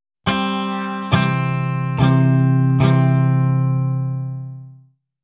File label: Back Guitar; K-ON